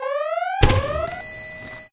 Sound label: Game Patient Die